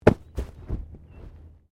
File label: Body movement Tumble Medium